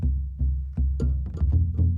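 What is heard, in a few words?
Calm Far, music; from dust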